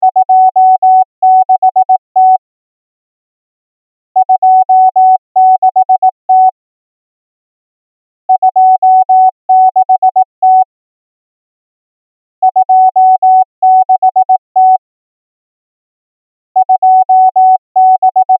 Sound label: morse